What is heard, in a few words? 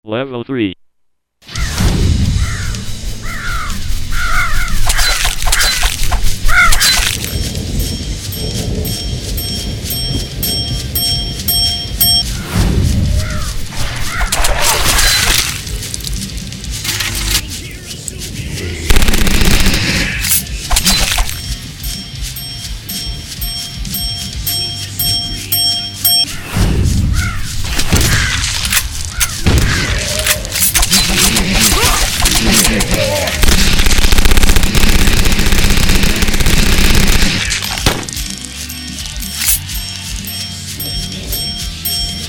zompocalypse birds; Zombie Game; Liam Erven